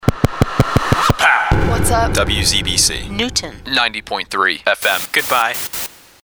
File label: POW